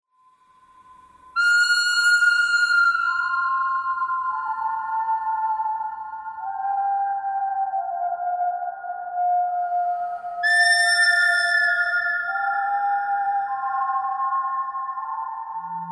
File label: bird theme bird cry pentatonic